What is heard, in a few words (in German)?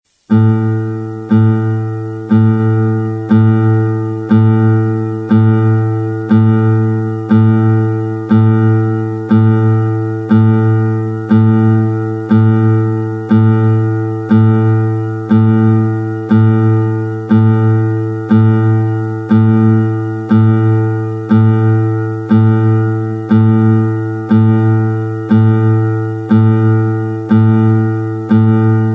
Gitarre Saite A